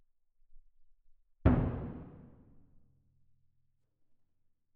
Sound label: Perc